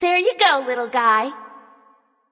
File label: ward placed; smite; awilix